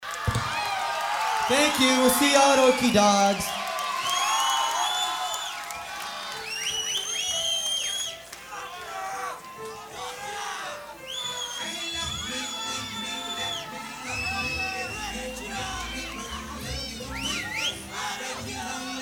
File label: crowd leaves; Witty Banter